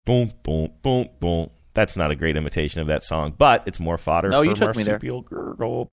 singing four notes saying thats not a great imitation